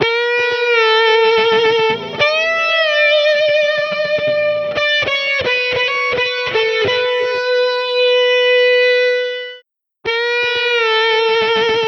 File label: Lead Guitar; Editing Hack